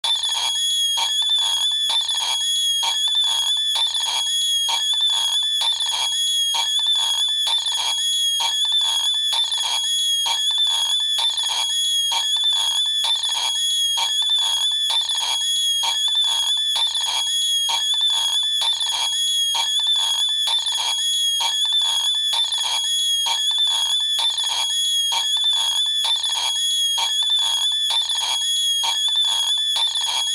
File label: tiger thrush